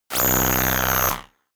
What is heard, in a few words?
screaming synth sequence short